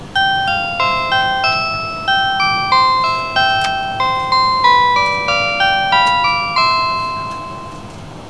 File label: chime